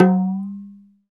PER Percussion

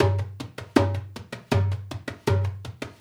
Dumbek; Essential Percussion

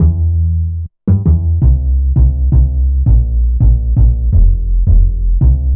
Fat Upright, Bass Lines 166.5; Jungle Warfare 1&2

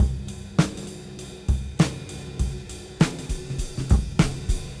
Cym Beat 99 BPM Rev

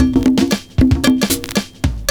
PERCS, 70 s Breakbeats

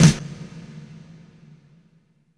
SNARE 2; East West - Phat & Phunky CD2; 90's Sample CDs

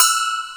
YANG-CH'IN; AKAI S6000 CD-ROM - Volume 1

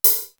ROCK EDGE; D4CYMB.HH; East West; Global Sounds

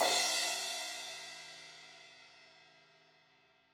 Crash 16in edge, Cymbals; Deeply Sampled Drums; Cymbals Stereo

